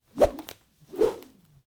whistling whip

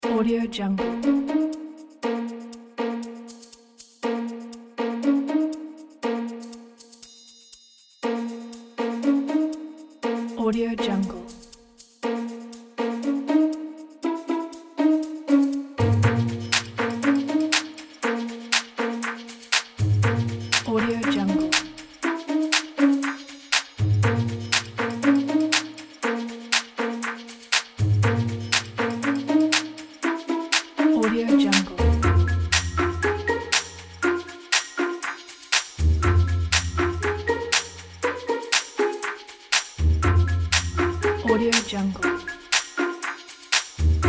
light and sneaky; bisontracks

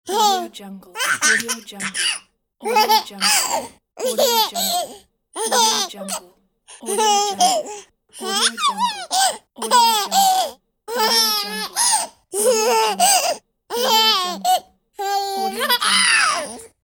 baby laughing

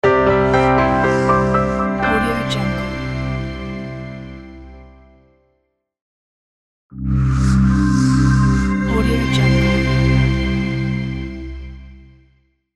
simple clean logo

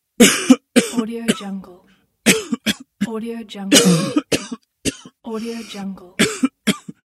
male coughing

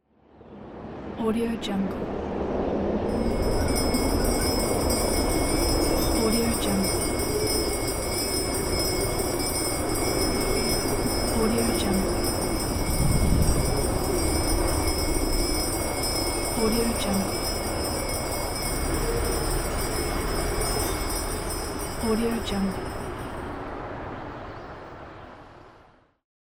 christmas wind; greenwaysound

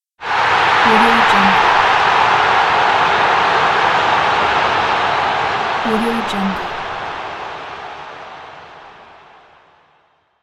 soccer crowd goal celebration sound

